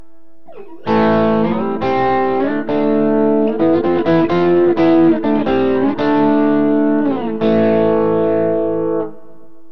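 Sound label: fralin bridge, guitar pickup; burny; fugly clips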